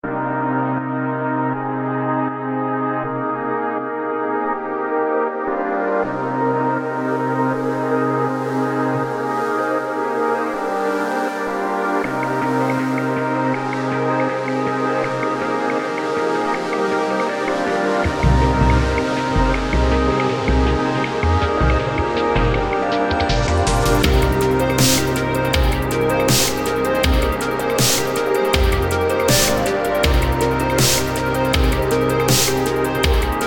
galactic synthwave

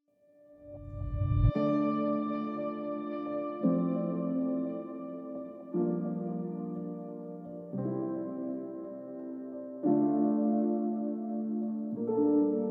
no vox less drums

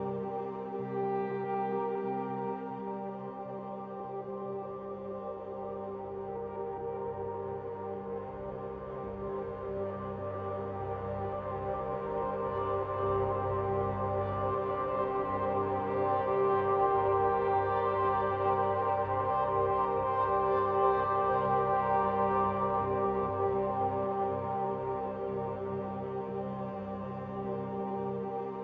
Cathedral loop C

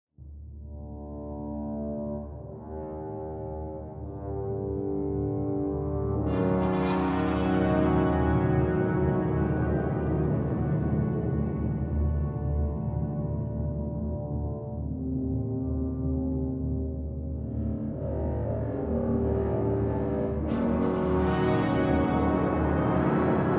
Cinematic

